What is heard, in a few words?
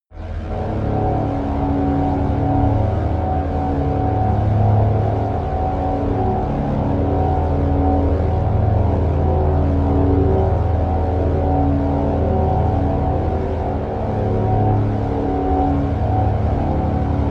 drone sci-fi generator